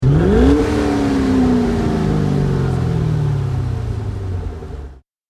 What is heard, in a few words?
mustang rev; fordmustang